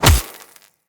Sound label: Melee Fist Impact Robotic Flesh